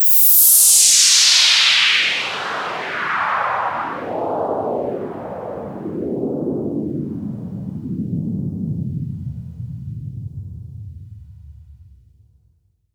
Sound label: Downlifter